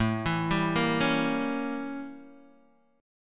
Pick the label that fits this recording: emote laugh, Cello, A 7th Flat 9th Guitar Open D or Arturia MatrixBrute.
A 7th Flat 9th Guitar Open D